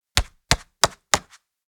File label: Monopoly board game token moving